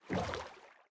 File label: paddle water, boat; minecraft